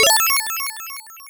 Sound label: Game Start; mcdonald s treasure land adventure genesis